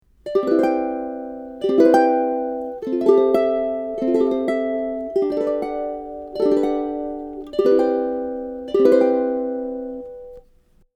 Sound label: Polychords, ukulele